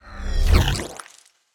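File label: space power lower obelisk; smite; Anhur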